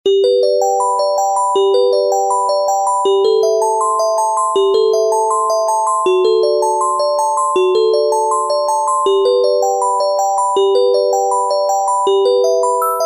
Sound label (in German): Klavier; Ringtones; Treo